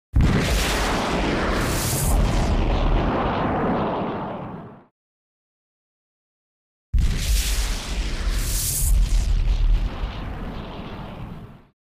Energy blast; explosions

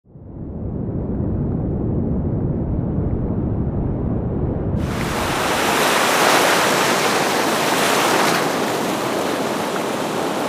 Submarine floats to the surface

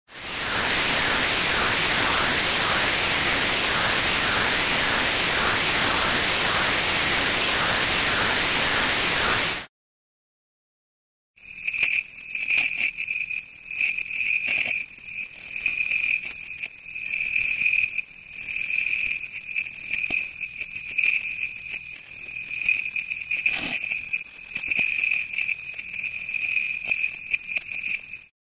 computer noise

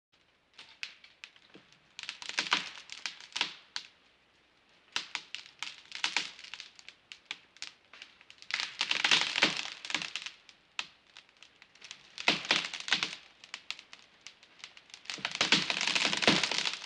Crackling wood, falling wood

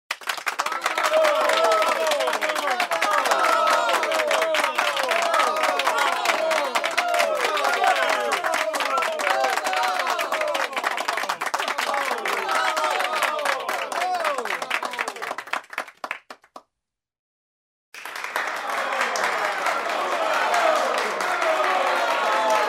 Ovations in a small room, shouts of bravo; standing ovation

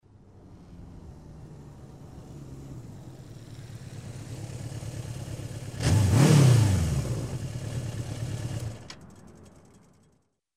Motorcycle approaching, turning off

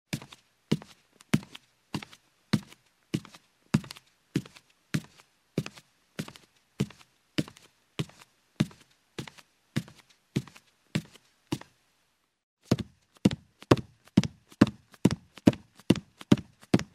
Barefoot steps on wooden stairs

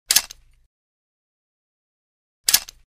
Pulling out checks; explosions

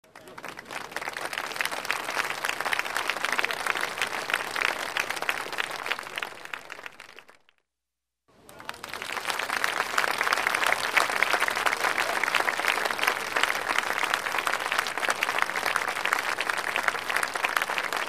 Applause from a small crowd; standing ovation

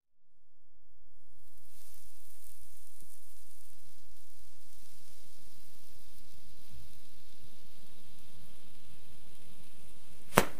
Pyrotechnics

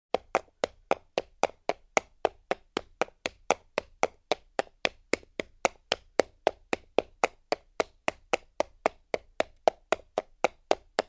Horse walking on concrete

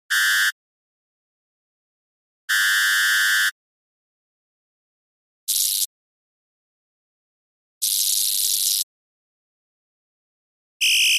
Electronic signal

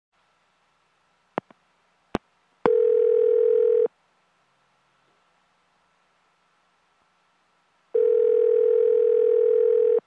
Phone call sound; telephone

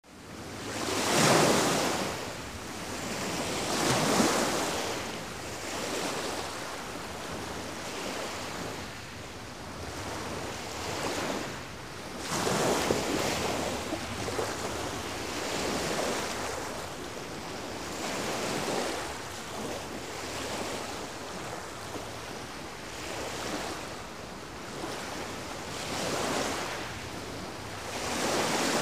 Surf, coast, light waves; sea

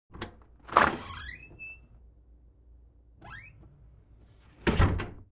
wooden door opens and closes with creak

